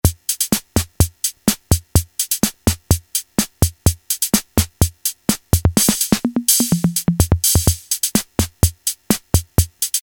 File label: pops, Casio MT-68 rhythm